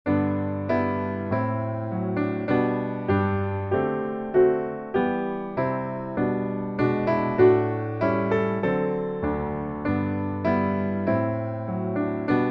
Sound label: piano